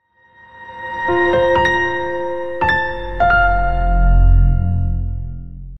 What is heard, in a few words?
SOUND LOGO SENNHEISER Audio Branding